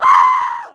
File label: attack